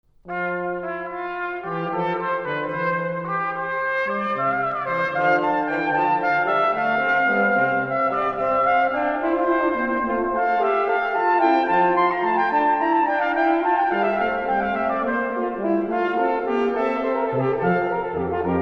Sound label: Canon at the octave, Brass Ensembles; Bach; Goldburg Variations; Varation 24